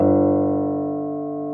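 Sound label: piano G1, soft piano; AKAI S-Series CD-ROM Sound Library VOL-2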